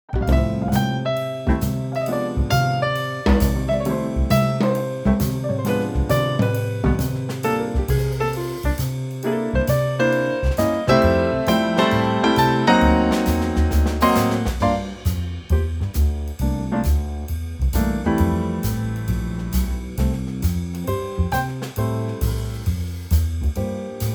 F Trio

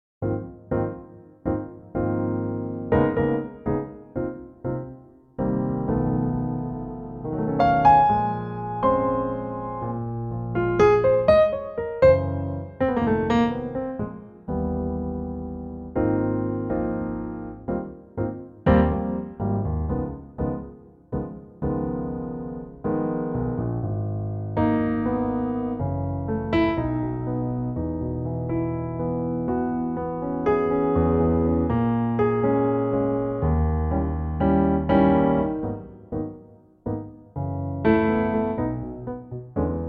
Ab Piano; female

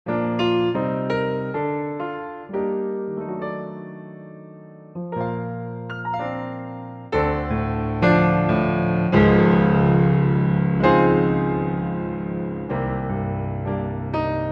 Bb Trio

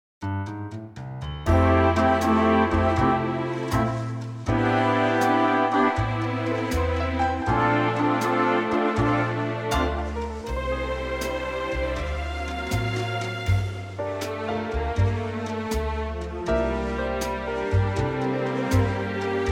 F orchestral

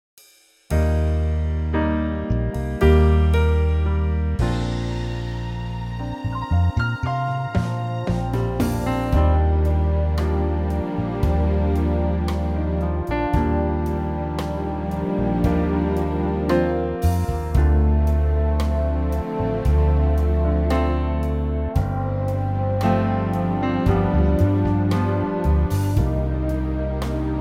Bb orch, male

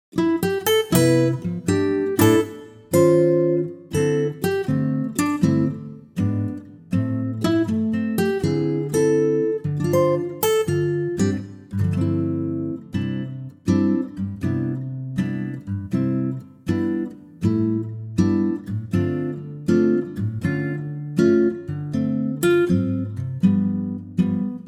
C Acoustic Guitar

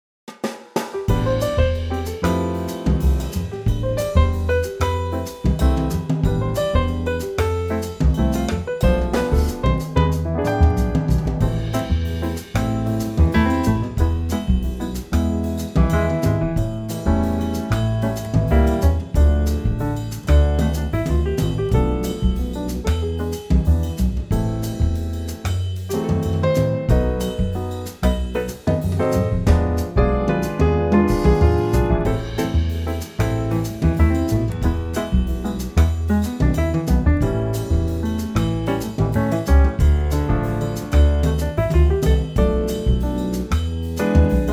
BOSSA NOVA Am; female

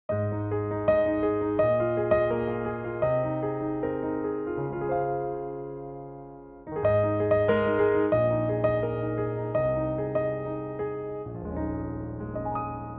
Ab piano

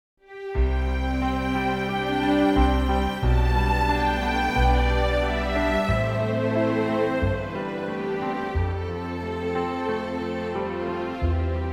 C orchestral

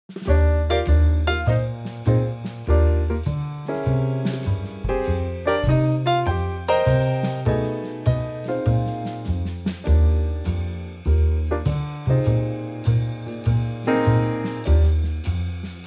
Eb Trio

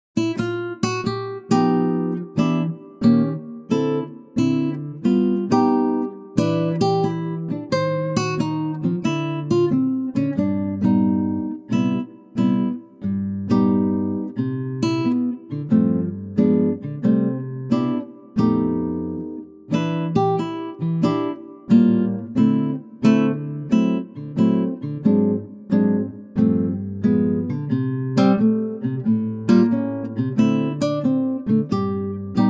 C acoustic guitar

